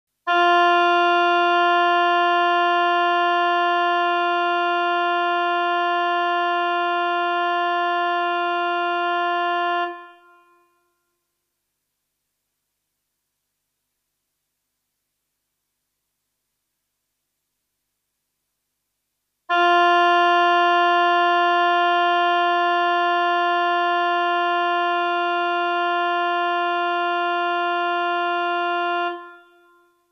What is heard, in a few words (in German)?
Stimmton f, Klavier; Blaeser-Team